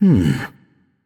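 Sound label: nonverbal thinking; voicepack; smite; merlin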